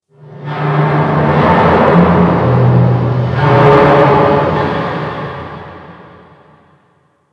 Ocean Low Creak; Warframe; Grineer Ocean

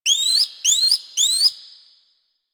Drifter Camp Bird Close; Ambience; One Offs; Birds; Warframe; Quests; The New War

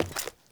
Wood Step; Ambient; Sprout Farmers

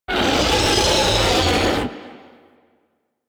Vocal Attack Short, Enemies; Warframe; Teralyst; Ropalolyst

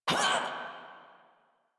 Pain; Kuva Lich; Warframe

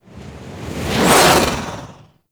Melee Swipe; Teralyst; Ropalolyst; Abilities; Melee Grab

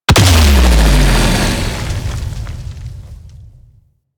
Tree Slam Impact; Enemies; Abilities; Warframe; Teralyst

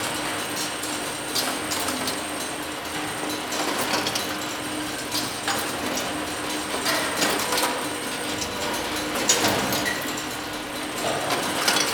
Elevator Loop; Props; Int Gemini; Warframe; Entrati

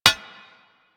Bullet Impact Armour; Warframe; Weapons

